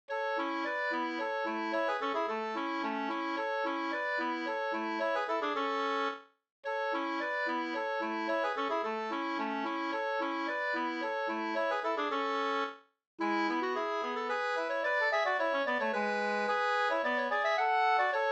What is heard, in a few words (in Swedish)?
slangpolska fr sodra Dalarna 2st